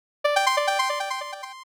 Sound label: 80s Arcade bonus; Nokia